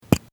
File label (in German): Fueller oeffnen